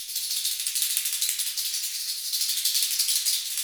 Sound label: FX Metal Jangle, Perc FX; Roland LCDP14 Africa VOL-2